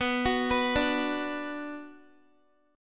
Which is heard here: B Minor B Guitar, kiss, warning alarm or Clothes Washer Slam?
B Minor B Guitar